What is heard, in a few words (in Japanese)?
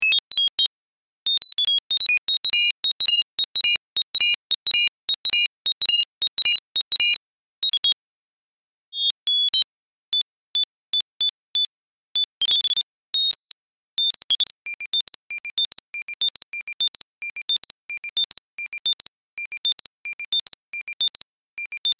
人工キビタキ; 鳥の声を分析して、再現してみた; 鳥の声研究室